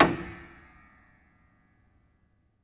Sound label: Hamm Perc, Piano Hammer Perc; Piano Miscellaneous